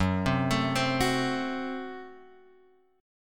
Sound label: Gb Diminished, down, Guitar, Open G